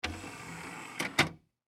Technology Dvd Player Tray Close; Entertainment System Sound Effects; SFX Bible 6

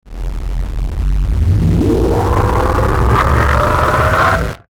Ascend Fuzz Static Swell Reverse; Blastwave

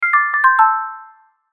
Logo Marimba Melody; Logo Marimba Melody Syncopation; Sonic Logo

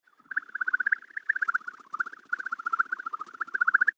Firefly Fantasy Flying Around; Aliens; Big Room Sound

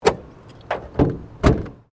Car Ford Focus Bonnet Close

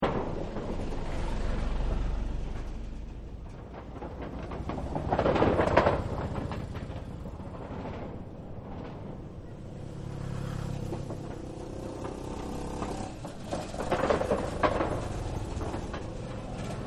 Traffic Wood Bridge Loop; Traffic And Cars

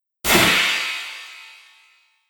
Metal Explosive Impact; Miscellaneous Impact Sound Effects; Andrew Fleming

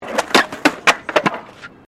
SKATEBOARD FLAT JUMP CRASH; Skateboard sounds; Skateboarding; Sports; Foundation4

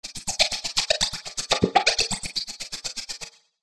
Chopper Filtered Reverb; Blastwave; Choppers

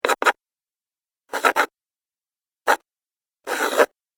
Pencil Sounds, Writing; human sound effects

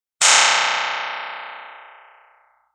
Clickie; Clicks; Multimedia

